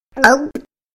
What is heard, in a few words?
gulp